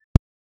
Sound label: mute button, ui sounds